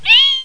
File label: bug die; mordor